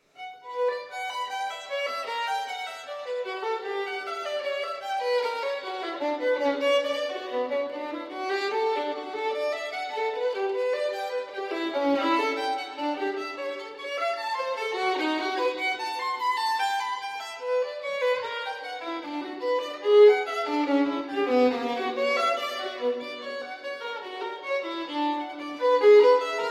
Violin Partita in B Minor Double; No. 1; BWV 1002 II.

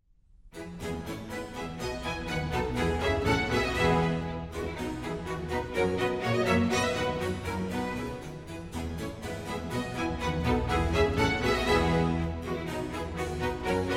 Water Music Suite In F Bourrée

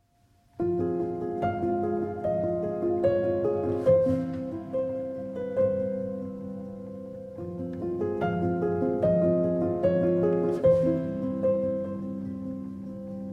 Nocturne in F Minor for Piano; Op. 55, No. 1

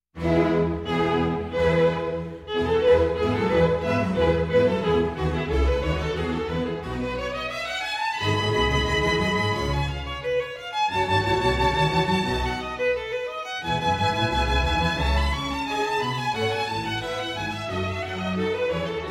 violin concerto; in E major, BWV 1042 - Allegro

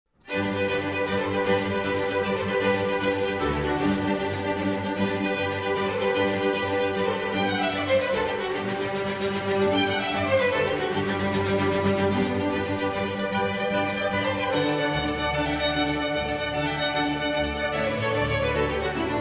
Concerto For Strings in G Major Presto; Alla Rustica

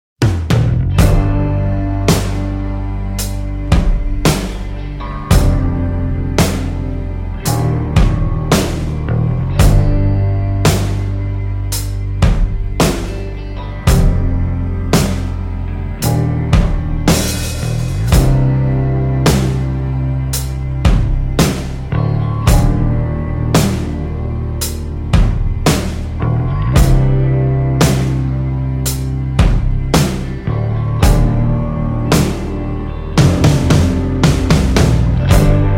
Instrumental

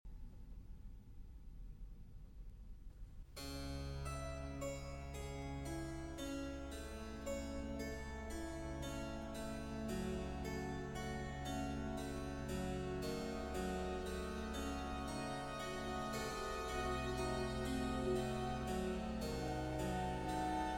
Concerto For Violin and Strings in F Major; Op. 8, No. 3, RV 293 L'autunno

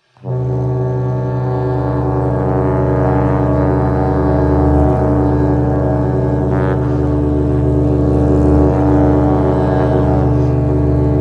B Minor Prelude

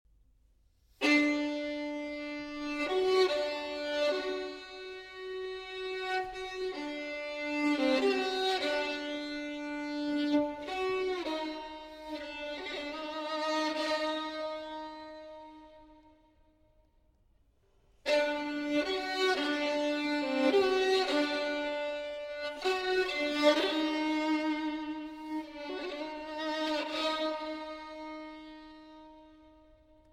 Solo Violin; Chants du Sud, Six Short Pieces; I. 1er Chant

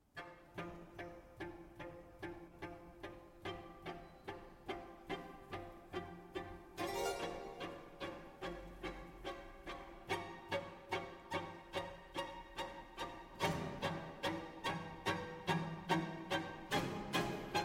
Violin Concerto in F Minor, Allegro Non Molto; RV 297, “L'inverno”

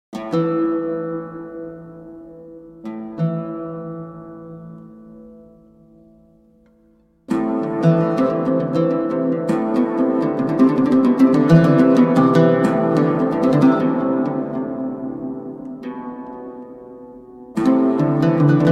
Emotional Arabian Oud

